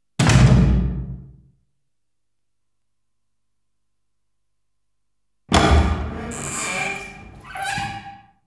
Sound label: Big Dungeon Cell Door; Halloween Sound Effects